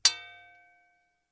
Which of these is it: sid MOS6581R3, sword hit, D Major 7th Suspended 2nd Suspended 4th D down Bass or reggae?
sword hit